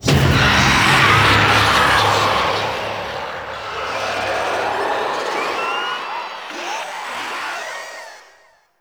Zoo Wave Start Stinger; Warframe; NineteenNinetyNine; Stage10TheZoo

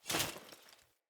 Grunt Foley Melee Swing Armour; Enemy; Sinecure

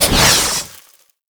Heat Gun Retract; Warframe; Grineer

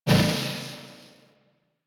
Boss Laser Stop; Warframe; Caliber Chicks

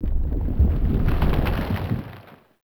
Rock Rumble; Warframe; Caliber Chicks; minigame

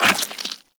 Step Goo, Footsteps, Walk; Warframe; Tenno

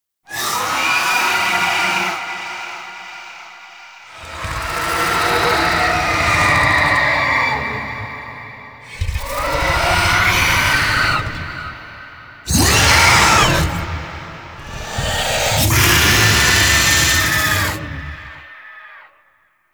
Angel Reveal Vocals; Warframe; Zariman Quest; Cinematics

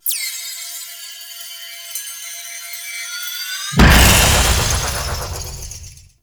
Despawn; Warframe; Duviri; Dax

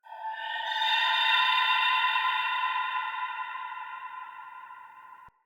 Day Texture, Ambience

